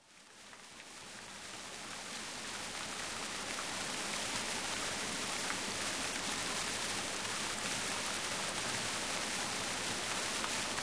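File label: dojd rain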